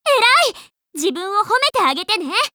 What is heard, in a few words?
applause; osu